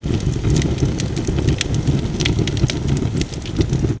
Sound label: fire